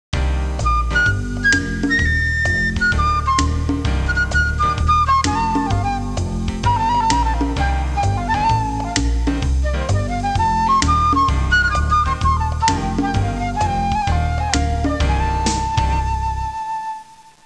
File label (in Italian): suonerie musica jazz